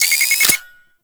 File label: Fortress Mine Warning; Warframe; Ambience; Grineer Fortress